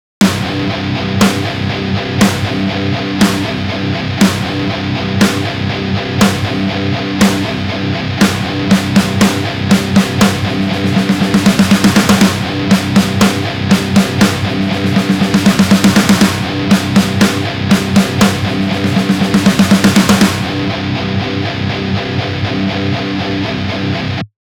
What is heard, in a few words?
snare